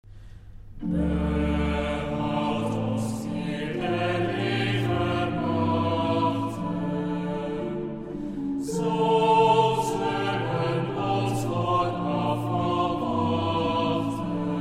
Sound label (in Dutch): Psalm vocaal